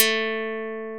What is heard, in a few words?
karplus simple; arduinisten